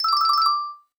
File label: quiz good